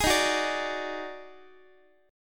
D sharp Minor Major 7th Double Flat 5th D sharp Guitar; strummed